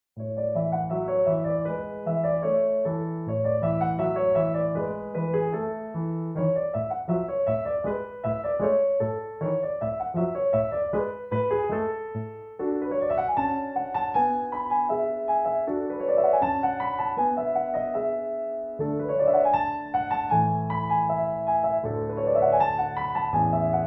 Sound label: Norwegian Mountain Dance No 3; Edvard Grieg (PD)